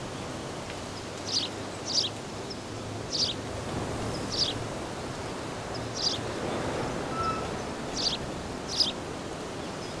Dorm Roof; Tokimeki Idol

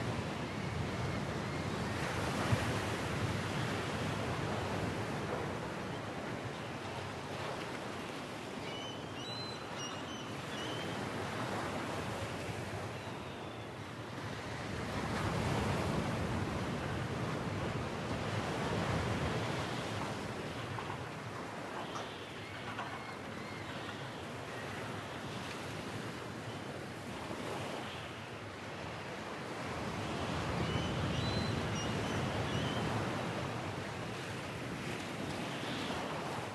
Beach; Club Penguin Online Unofficial Soundtrack

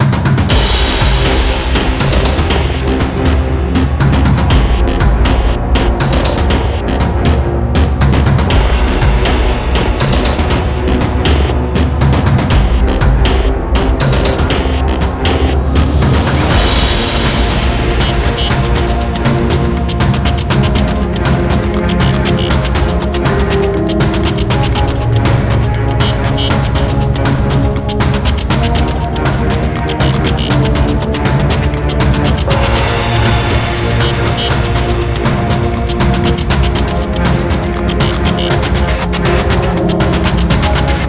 Musik Forest Exploring

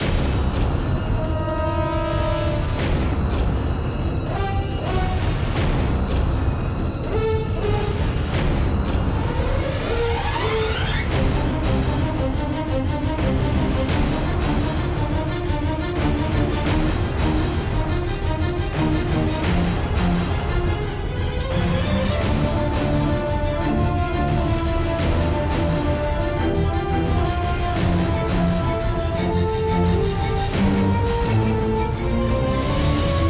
Bgm Boss; Mortal Blitz; PS4, Windows

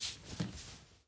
Shower end short

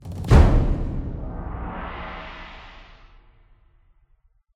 combat end; biomutant

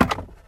Door Open

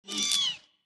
Bat Die

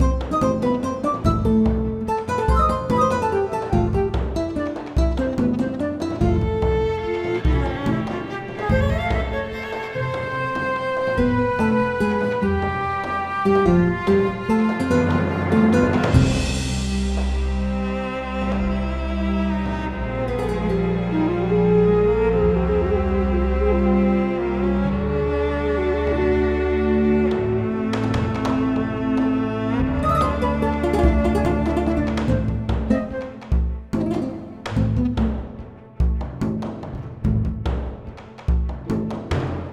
Desert; Azure Saga Pathfinder; iOS, MacOS, Switch, Windows

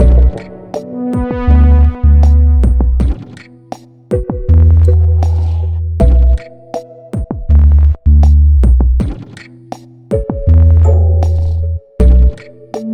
stem outro; Pix the Cat

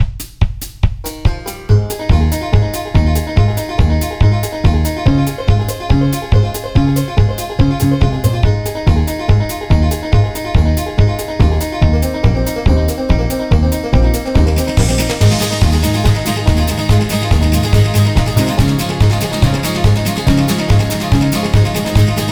Banjo Bonanza

